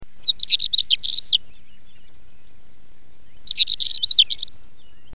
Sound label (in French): tarier pâtre